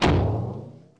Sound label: WHEEL; moonchld